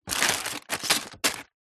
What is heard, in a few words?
food potato chips packets movement in card box